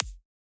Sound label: kick; osu